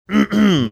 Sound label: clearing his throat